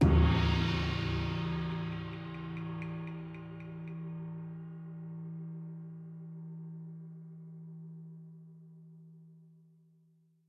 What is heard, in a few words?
STING; KUNLUN